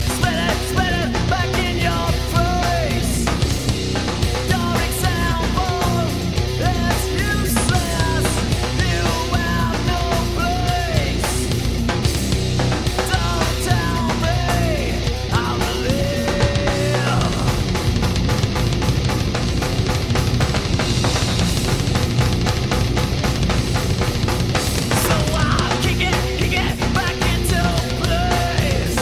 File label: Spit